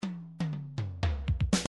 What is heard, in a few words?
midi drums drums fill